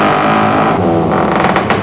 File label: coffin; valve; vamp